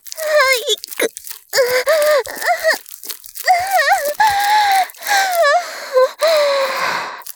絶頂, ASMR; 騎乗位

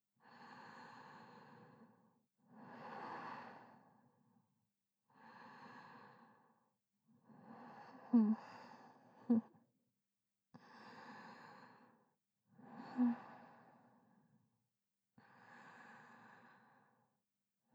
寝息; 和胜似男生性格而且懒散的褐色精灵♀不断卿卿我我的交尾日常。; 低音声

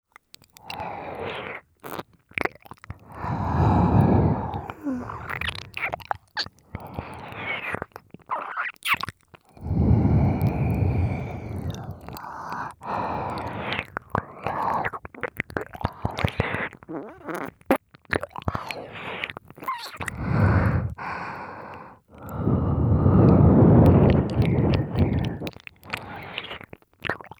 脳バグ まったり MIX 片耳, ASMR

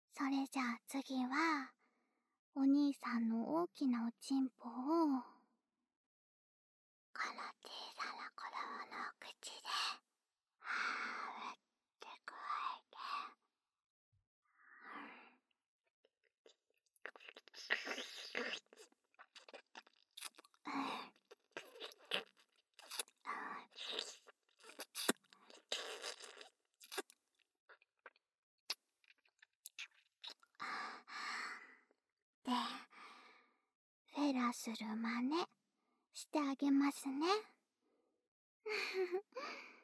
フェラマネでねっとり激しく搾られる; シコシコボイスなしver

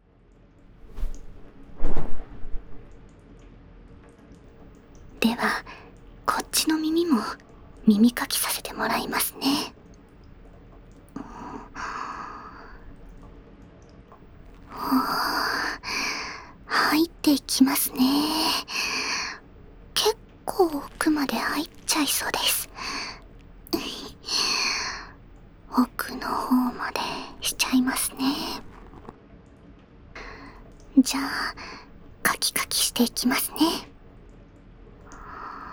膝枕で耳かきと囁き（左耳）, ASMR; 雨宿りの里～耳を澄ませばほら、あなたを癒やす音がすぐそこに～

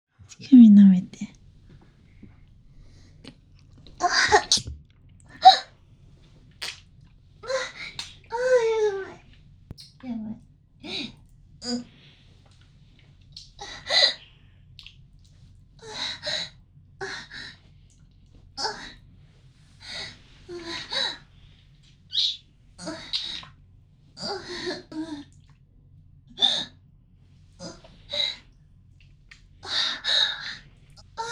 乳首を舐める

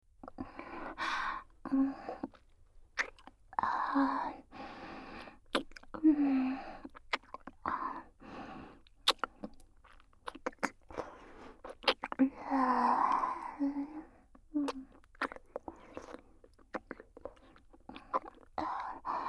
イチャラブベロチューループトラック, ASMR; 奴隷エルフ姫

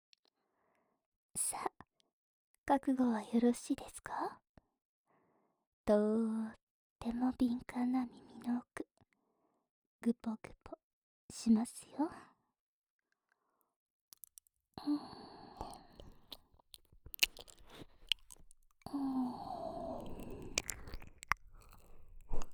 待ちに待った、ぐぽぐぽ耳舐め; VIPにならないと会えない美人店長の秘密の極上サービス

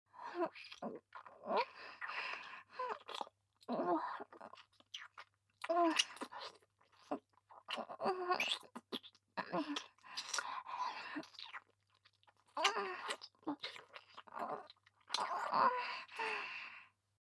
ディープキス; 性欲旺盛な陸上部の先輩後輩JK、ベロチュー青春溺愛えっちでコーチの汚ち◯ぽ生ハメ特訓♪

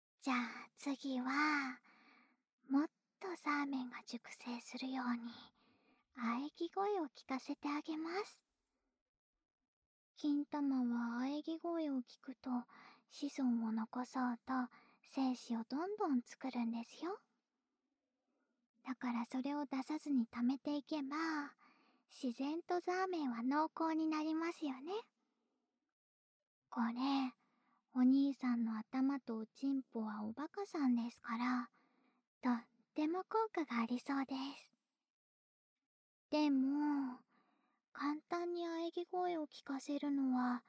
喘ぎ声でお射精誘惑; でも寸止めされる♪; シコシコボイスなしver

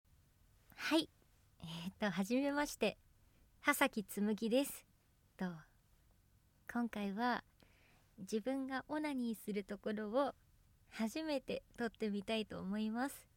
初 ガチ 実演 オナニー; こんなのダメ...気持ちよすぎちゃう...THE FIRST ORGASM

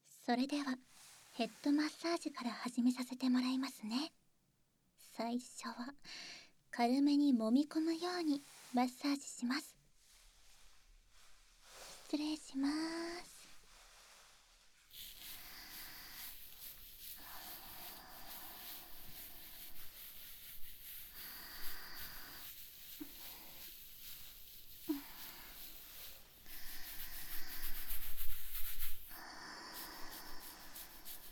ヘッドマッサージ, ASMR; イヤートリートメントサロン・オレイユ ～一ノ瀬編～

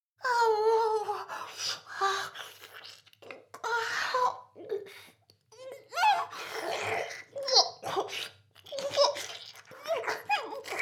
フェラ; 全妹計画２; 【メスガキ妹】わからせてくれるのよねぇ～?おにぃ～・ちゃん♪【全妹計画<オール・シスターズ> 2妹め】

